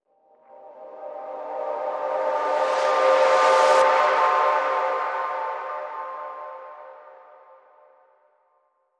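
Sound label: Light Synth Crescendo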